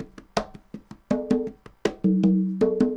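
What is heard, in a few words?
congas 80 bpm, latin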